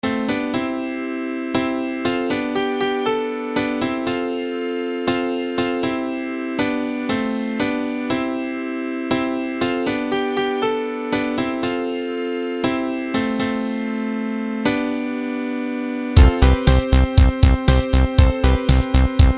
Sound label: The Organ